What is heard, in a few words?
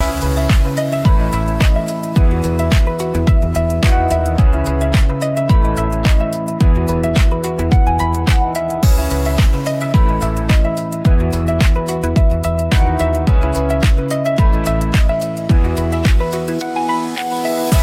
loop